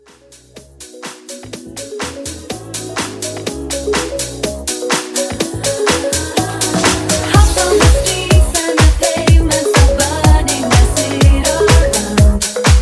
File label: House Mashup 124